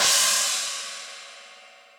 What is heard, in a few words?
China Steely Tight, Cymbal; Drums; Drum Hits; Standard Hits; Session Drums Studio